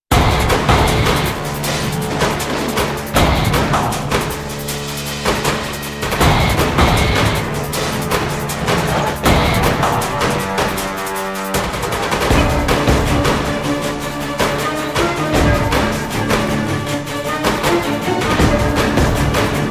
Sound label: battle; wot